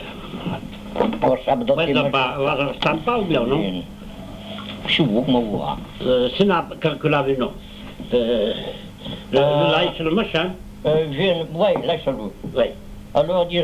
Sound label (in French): mazurka; Trenou